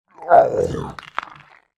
horror monster zombie male eating body single bite; sound effects one